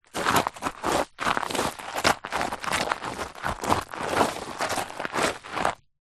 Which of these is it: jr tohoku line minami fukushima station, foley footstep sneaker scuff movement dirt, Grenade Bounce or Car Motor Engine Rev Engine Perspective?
foley footstep sneaker scuff movement dirt